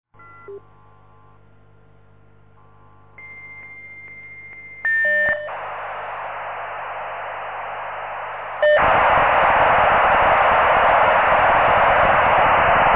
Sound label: v32b 14400bps, sound of modems